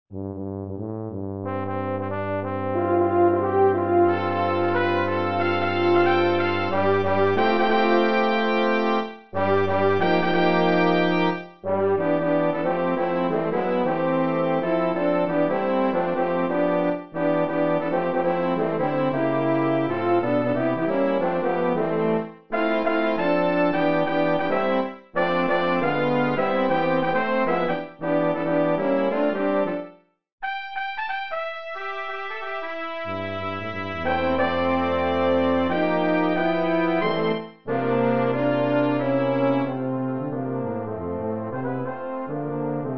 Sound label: housetop 5tet; xmas